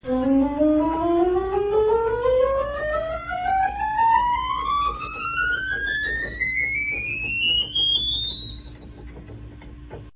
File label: octave, organ